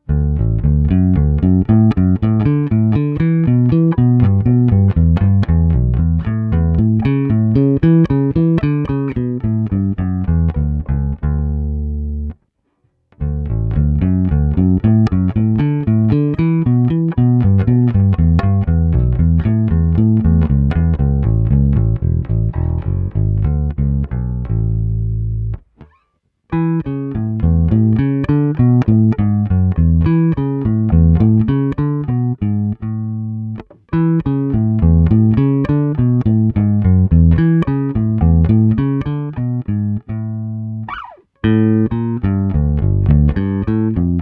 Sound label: Solo Pieces For Bass Guitar